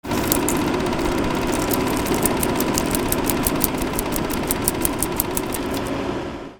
CAMERAS

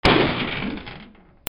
CARTOON

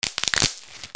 BREAKING

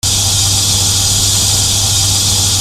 INDUSTRY

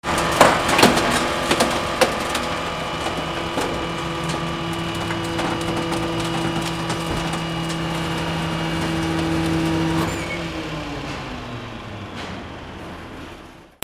VEHICLES

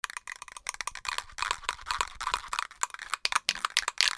GARAGE

